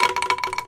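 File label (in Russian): сияние лучей низкий близкий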